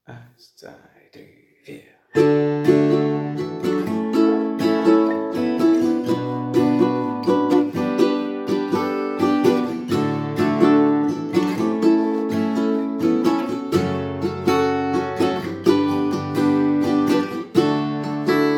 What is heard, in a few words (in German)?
Gitarre; Schulhaus Lenggis